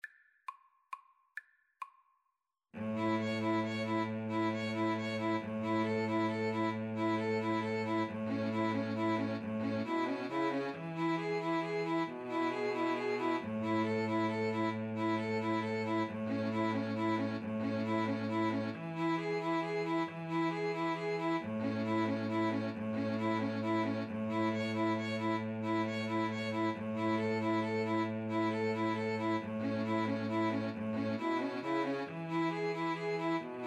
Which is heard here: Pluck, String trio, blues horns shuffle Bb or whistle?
String trio